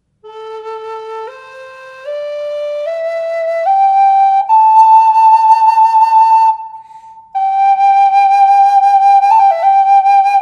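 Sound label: reverb, flute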